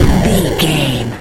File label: retro explosion deep